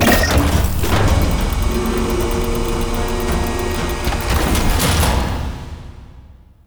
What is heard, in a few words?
Reactor Expose; Warframe; The Teacher; Mod Quest